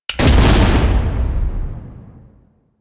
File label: Shotgun Shot; Weapons; DE; Warframe; Tenno; Pod Shotgun